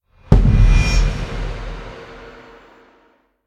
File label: spawn; escape from frankensteins tower